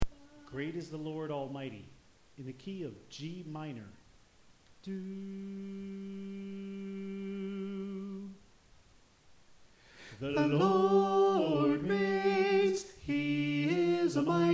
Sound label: Alto